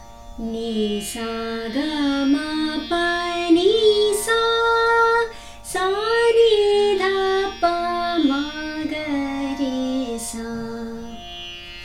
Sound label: Bhimpalasi Aroh, raag hindustani